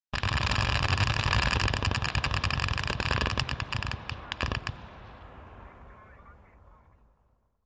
5.6mm gas operated rifle, platoon fires 200 rounds on automatic radio (Distant perspective); Guns Gunfire; BBC